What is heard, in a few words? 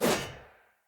Sphere Polearm Whoosh